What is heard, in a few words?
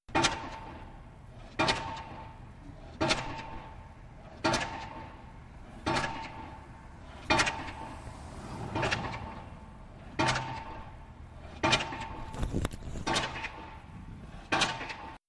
Shoveling Repeated; oil rig